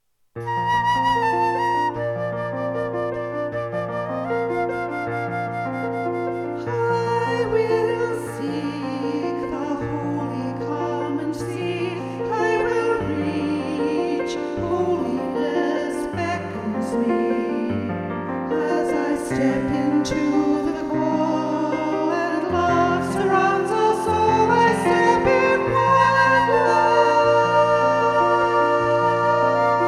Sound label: SATB flute piano